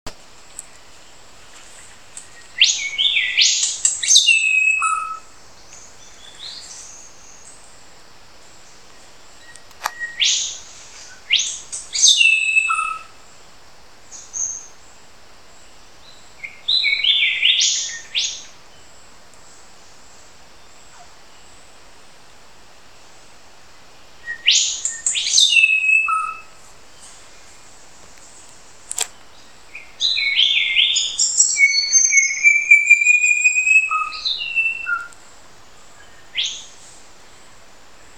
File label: Colluricincla Boweri; Bower's Shrikethrush